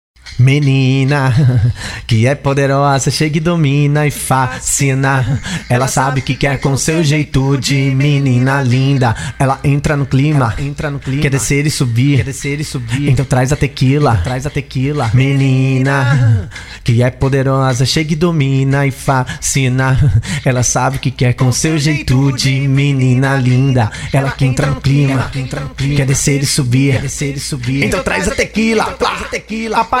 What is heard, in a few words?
acapellas funk acapella remix